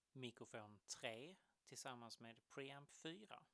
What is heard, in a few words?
mic pre